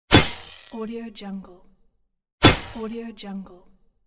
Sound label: energy pole weapon; game